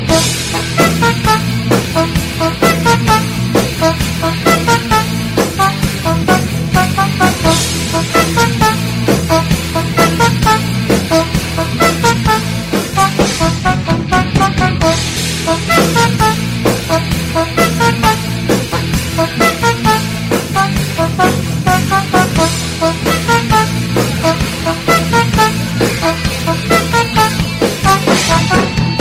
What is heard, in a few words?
horn version